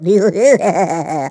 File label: clown laugh; Ringling Bros. and Barnum & Bailey; Wii